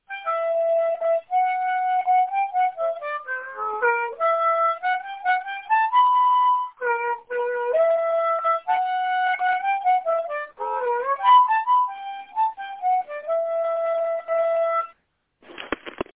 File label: traveling melody on harp